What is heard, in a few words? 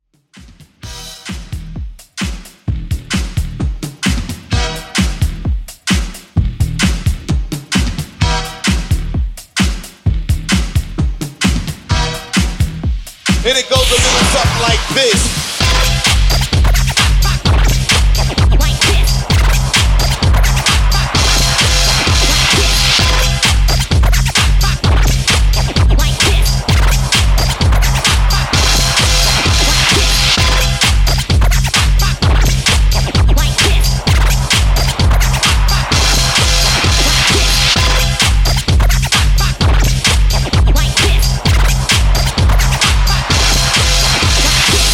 Scratch In 130Bpm